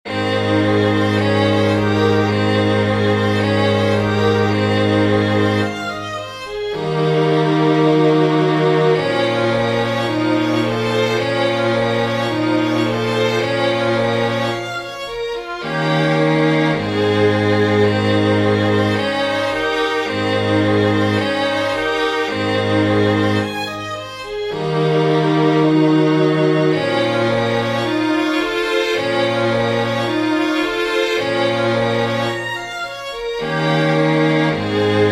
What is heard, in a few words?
string quartet; heller stephen